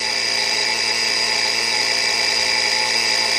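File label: Clock Alarm Loop Electric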